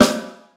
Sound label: snare a key